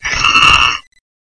slower death; cof; suicider

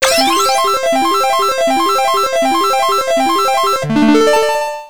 item box; tf2ware ultimate

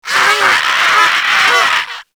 kid zombie death; nmrih; childrenofthedead; zerosiki; slender

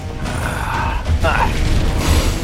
laugh; freak fortress 2; armstrong

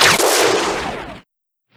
shell blue explode; tf2ware ultimate; mariokart

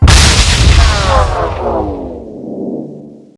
spider slow shot hit; granny; zerosiki; slender

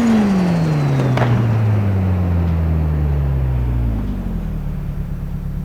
slowing fast, vehicles cars; sgmcars

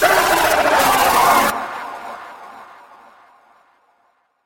damaged; missingteam

